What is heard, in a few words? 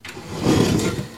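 Drawer Open Small Wood; drawers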